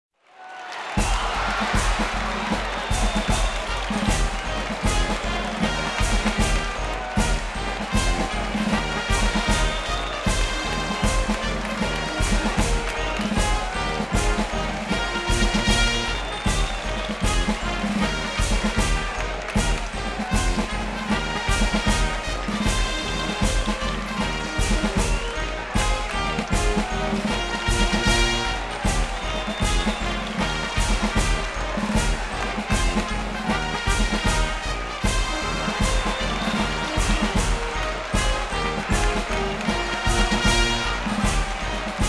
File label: Music Parade Band